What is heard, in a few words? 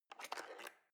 knife wood drawer handle scrape